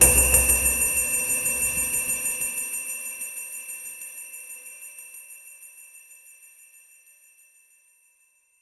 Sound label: divine